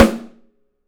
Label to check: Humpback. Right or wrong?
Wrong